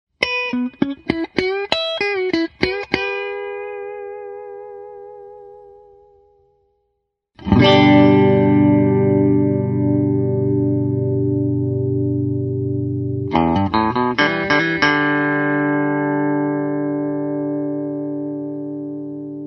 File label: strat compressor